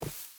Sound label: footstep boots grass run; Rust